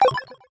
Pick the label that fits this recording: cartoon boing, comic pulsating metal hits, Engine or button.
button